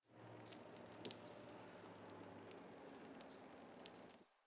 Exterior Water Drip; Warframe; Ambience; Nineteen Ninety Nine; DE

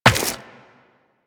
Run Rock, Footsteps; DE; Warframe; Tenno

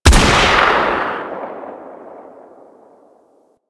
Sniper Rifle; Weapons; Female Sniper Rifle; Grineer

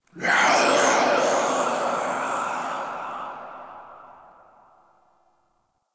Ghost Death, Vocals; Enemy; Nightfold Test Range Ghosts

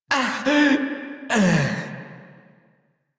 Death Male; Railjack Crew; Perrin Sequence

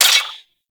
Mech Add B; Velox Prime; Protea SMG

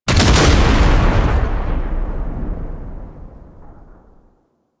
Alchemy Vent Explosion; Warframe; Entrati

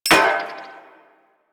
Flame Spear Mech; Grineer

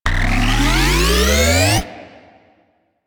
Electro Mag Charge Up, Weapons; Warframe; Corpus

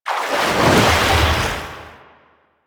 Crushing Water Positional Cast; Warframe; Yareli

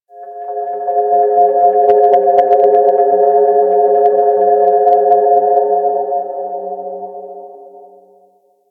Clan Dojo Research, Ambience; Warframe; Dojo Randoms

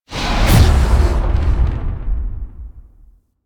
Lift Transfer Cast; Warframe; Focus; Naramon

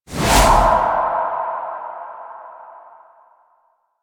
Turn Whoosh; Cinematics; Shrine Intro; Warframe; Sacrifice; Umbra

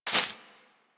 Bullet Impact Debris Grass; Warframe; Weapons

